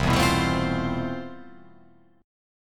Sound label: C Major 13th C